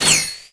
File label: bolt